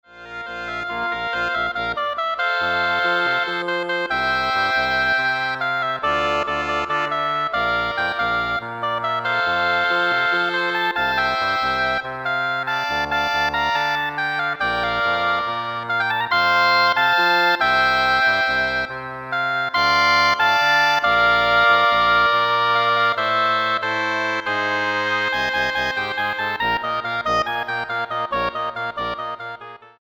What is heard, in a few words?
Double Reed Ensemble